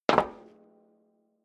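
step dress boots metal rattle single stair up, footsteps; schritte; menschen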